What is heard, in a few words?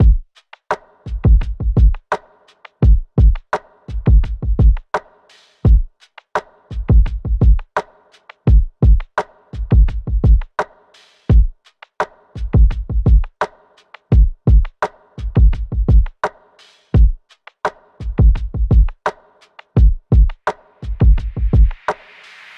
Drum Loop - 85 BPM; Find; Cymatics; DOPE DRUMS VOL. 1